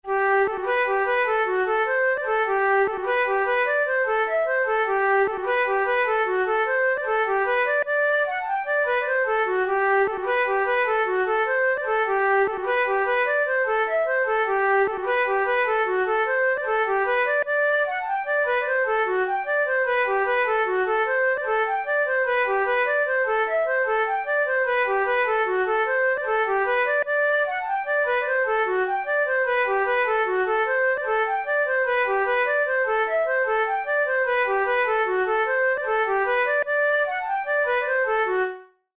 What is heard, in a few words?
rolling jig, flute